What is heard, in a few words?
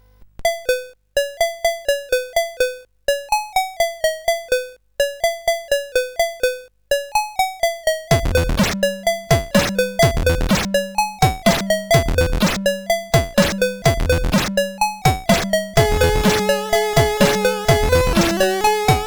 Jingle Rock, CSG8580R5